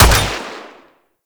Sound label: Fire 3D, Weapons; Gorgon